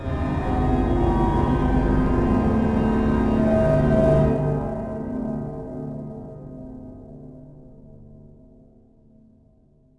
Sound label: atmo church organ inside; Haunted